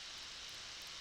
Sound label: Background Noise